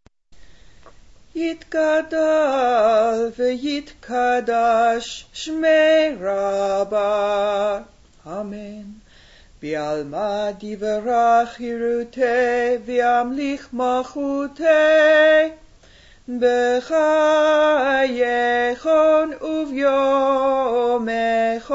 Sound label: Chatzi Kaddish